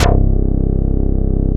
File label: HS BA, synthesizer bass; Synthesizers; Soli-Music; World of Synthesizers VOL-1